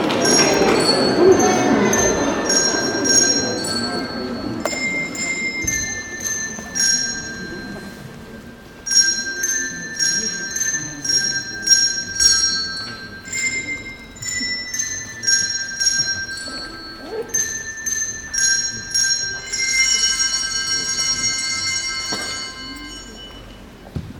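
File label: HandBell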